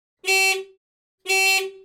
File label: Car double car honk short and medium